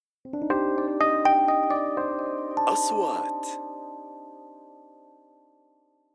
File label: intro piano